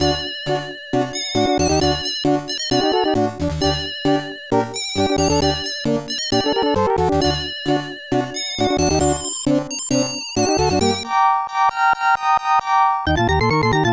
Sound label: tango; The Music Studio